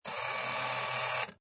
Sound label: Pinball Sound Motor Or Crane Long; Video Games